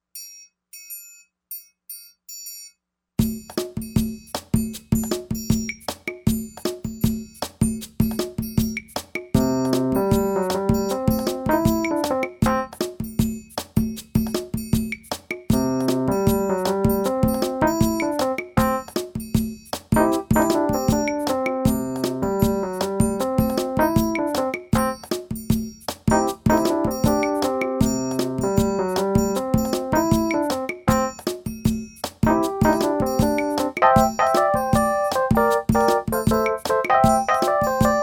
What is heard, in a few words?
Inspirational